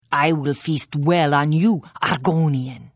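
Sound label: Attack, Khajiit female; The Elder Scrolls III - Morrowind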